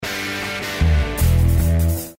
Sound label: Text Tone